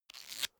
small book riffle through fast

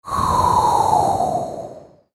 airy smooth transition

